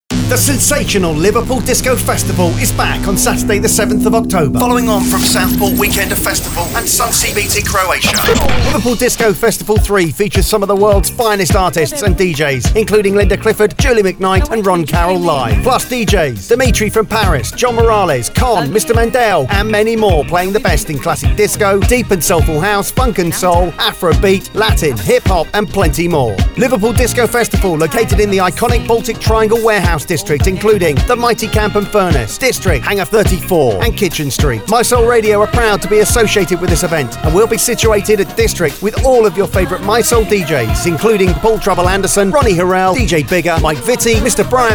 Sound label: Disco